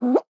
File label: player jump; fallguys